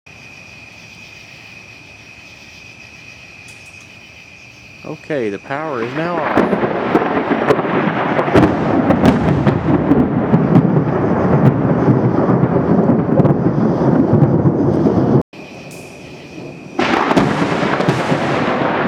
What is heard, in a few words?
thunder